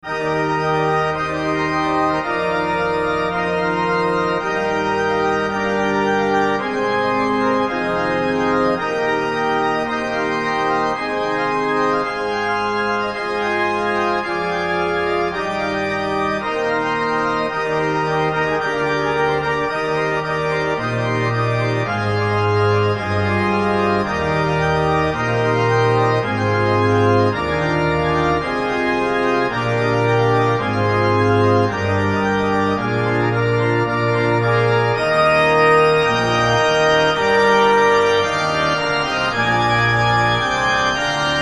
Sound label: organ